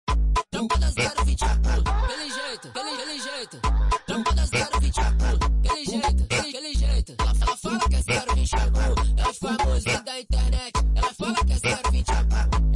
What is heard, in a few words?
funk; cr7